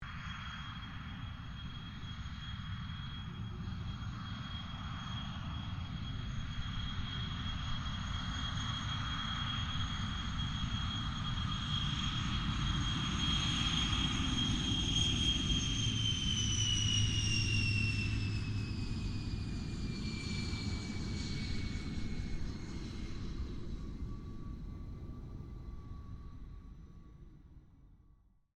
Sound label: AIRCRAFT GLOSTER METEOR F MK8 TAXI DISTANT; Gloster Meteor figter jet; Airplanes; Vehicles